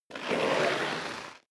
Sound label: step leather shoes concrete grit slide long; schritte